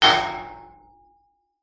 impact metal panel or pole hit small hard object resonate